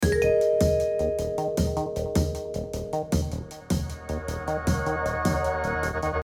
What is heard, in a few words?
Short mistery